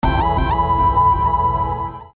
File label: report